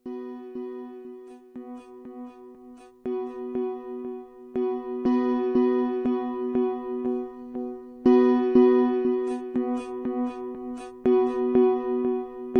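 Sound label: echo C sharp major strummer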